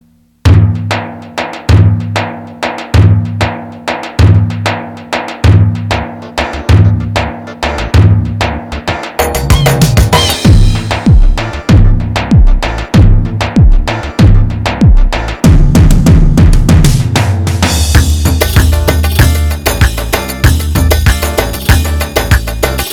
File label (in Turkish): 2 4 davullu, roland gw 8 ritim